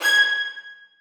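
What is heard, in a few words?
1st violins staccato G6; Sonatina Symphonic Orchestra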